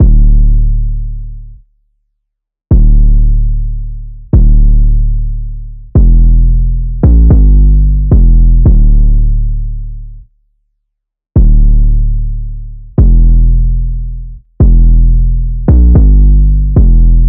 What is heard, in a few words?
808 loop 111 Dm; Slate Digital BASSHOLE Sample Pack; 808 Loops